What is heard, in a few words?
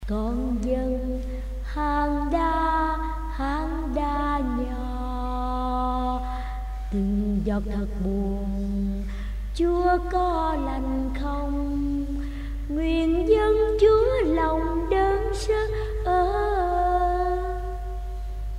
alto